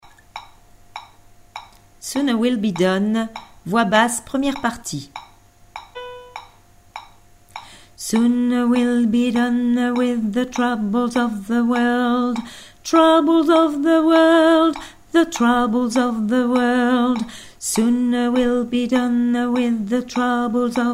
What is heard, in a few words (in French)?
basse